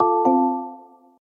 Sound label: Short Notification